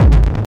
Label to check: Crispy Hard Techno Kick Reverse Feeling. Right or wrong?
Right